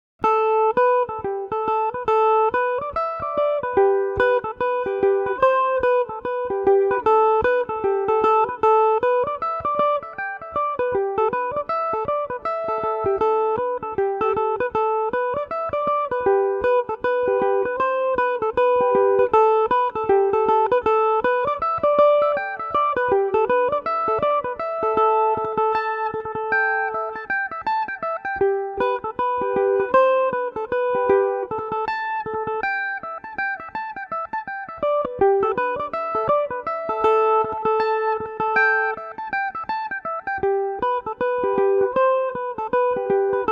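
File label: A dorian revelation, Irish mandolin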